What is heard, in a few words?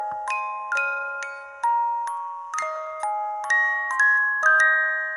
music box